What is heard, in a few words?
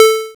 TECHNO; E-Lab; X Static Goldmine 1